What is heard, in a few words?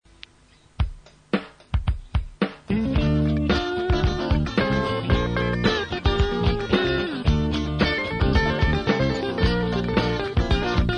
Guitars